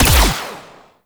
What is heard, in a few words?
Energy Ball Fire; Warframe; Corpus; Mine Osprey